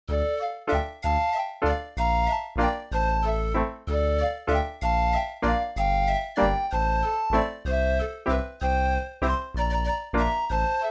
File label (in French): bourree; camprieu